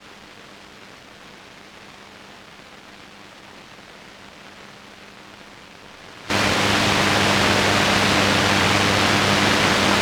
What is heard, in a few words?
Retro Radio, Static; Sound Ex Machina